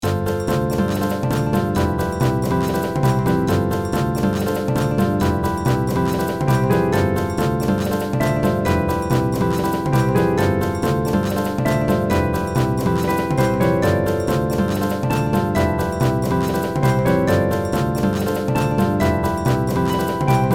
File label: full of exotic percussion